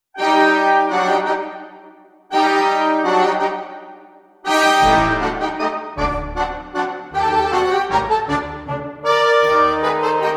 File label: Warriors Dance - Fanfare